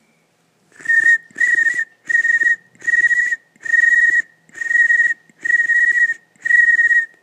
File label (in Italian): grillo